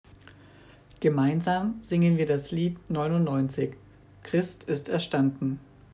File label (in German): Lied